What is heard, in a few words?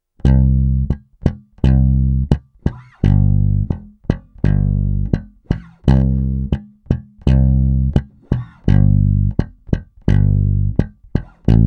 Bass Only; Ghost Notes; 7-Day-Bass-Challenge